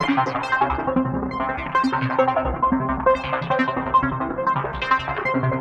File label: Seq Fast arp, Drum & Bass; Sonic Foundry (Sony Creative Software); Knutrix Drum & Bass